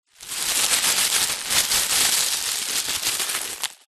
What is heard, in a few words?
foley bag plastic small very thin movement